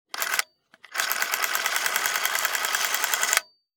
Antique Mechanics - Super 8 Camera Filming; SoundBits

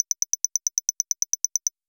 Text Digital Readout

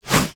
Swoosh Branch Whoosh; InspectorJ

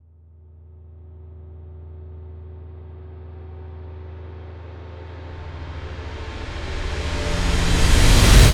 Reverse Riser Whoosh; Epic Stock Media; Bane Cinematics

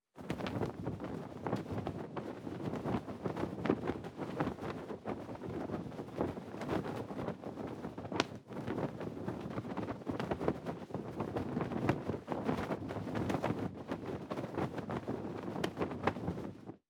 Flag waving in the wind; Foley Walkers

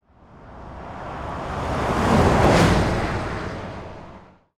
coach bus passing by fast exterior; Front Row SFX by Pole Position

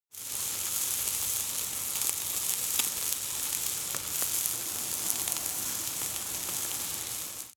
Fire Grill Sizzling; Vadi Sound; The Elements

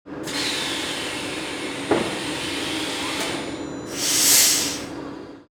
Car Repair Shop High Pressure Air Release; Ambisonic Spaces; Industrialist

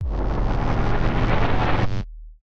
Rising Flickering Whoosh; Krotos; Cinematic Transitions

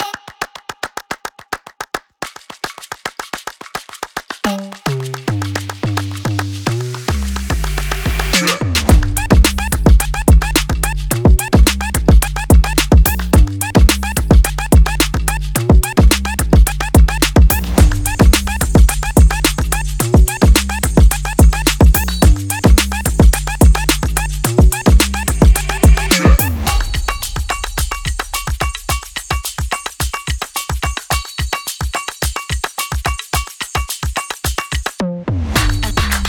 Urban Sport Claps; GLO; EUROSHOP

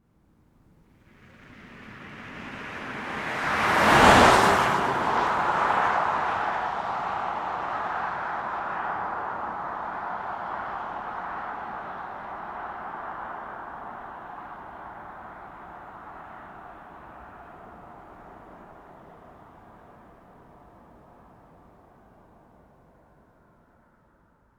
Tesla Model S - Car Passing By Fast Left to Right; Redline Sound Library

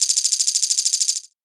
Rattle Counter; CB Sounddesign; Infographics

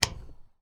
Tractor - Back Lights Turn Off; Soundkrampf

